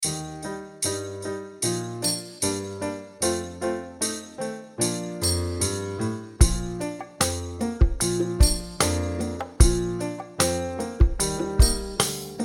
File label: jingle bells slower